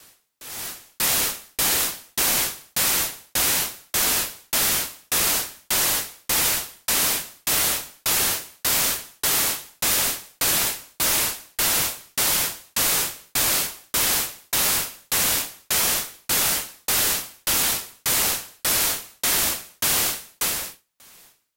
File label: Noise